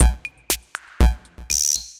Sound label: Kit-C78, Effected Drum Kits; Drum Machines; Ableton; Factory Packs